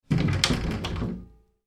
household door double glass panel internal open, Wooden Glass Panel Double Door Open; Doors Open - Wooden Glass Panel Double Door Opening; Sliding Doors; McKinneySound